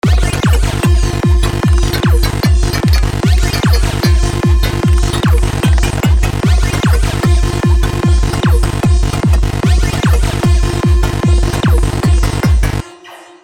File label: hard fullon trance